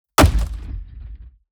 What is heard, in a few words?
Impacts PROCESSED; SoundBits; Just Impacts